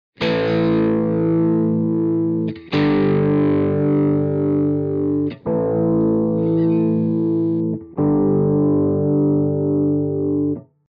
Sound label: low pass filter; orinj